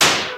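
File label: percussion F, CRAZZY PERC; Best Service ProSamples vol.55; Retro Sampler; CASIO FZ-1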